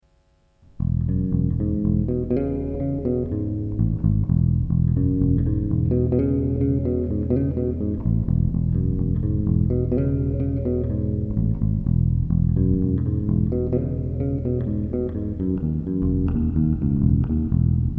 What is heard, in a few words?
Bass; VintageRVB